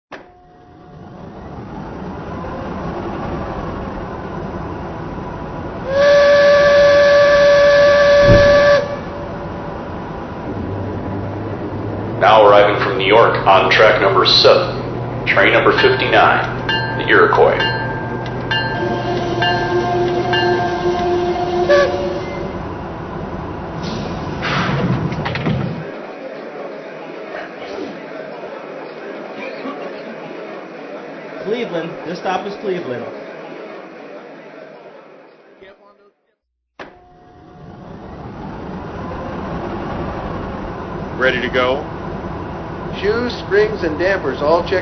Box Cab

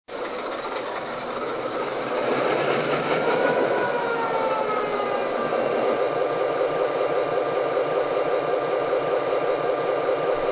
E6, trains

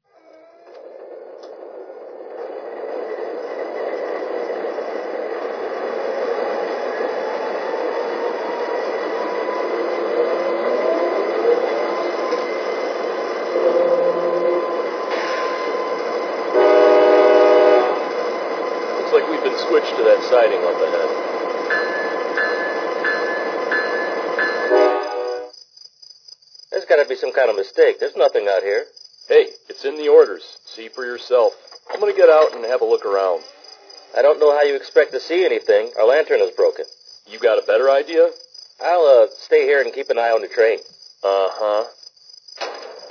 ES44, trains

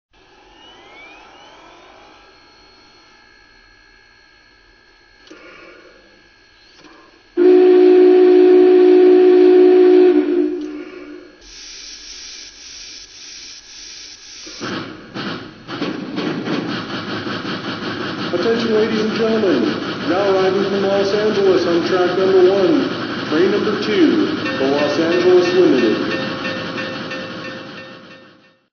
BigBoy; 4023

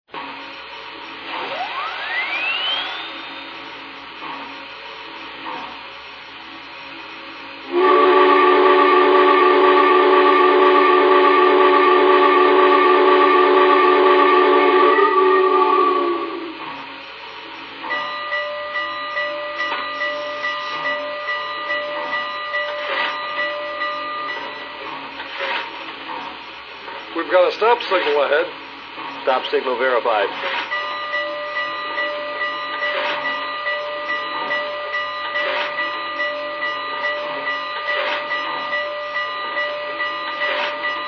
bigboy frt

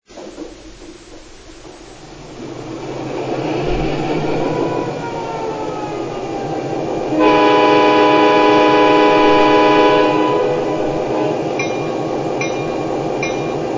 F7 ABA

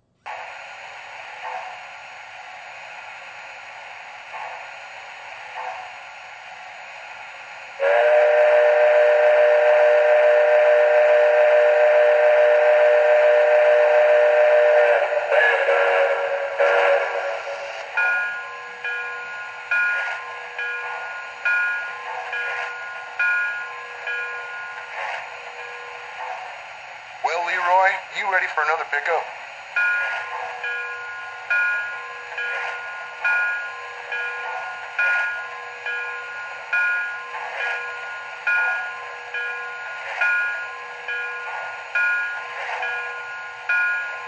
Triplex

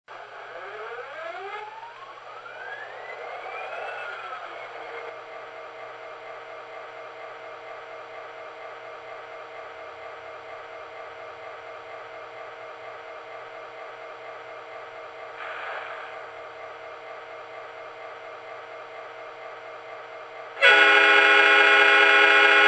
F40PH

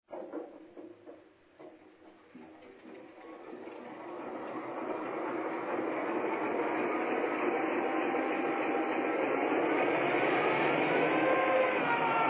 GP9

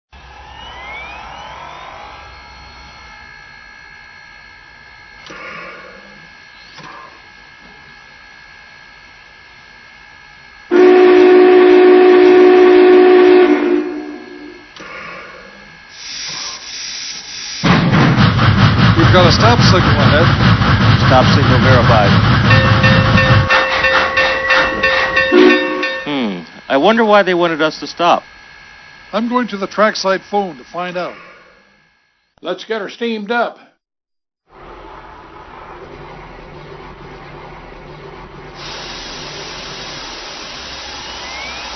Big Boy, trains

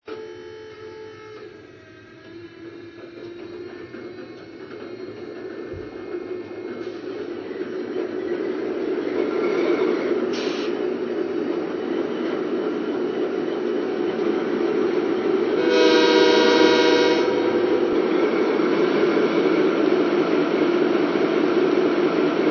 GE Dash9

